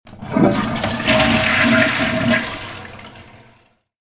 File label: flush